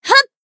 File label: charge shoot; tina